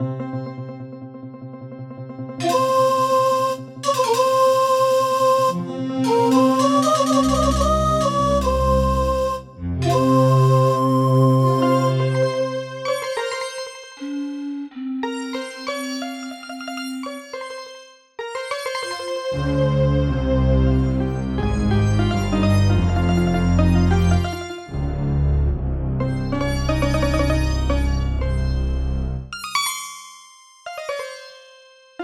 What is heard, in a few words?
Casio CTK-800; Prince of Persia 2 - Intro